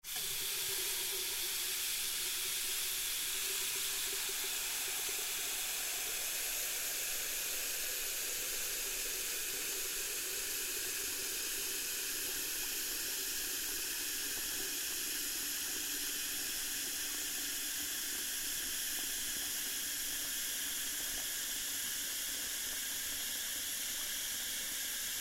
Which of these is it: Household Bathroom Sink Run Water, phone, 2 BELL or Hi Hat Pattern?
Household Bathroom Sink Run Water